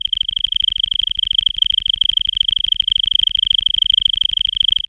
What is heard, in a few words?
SFX, synth atmos & fx; Dream Zone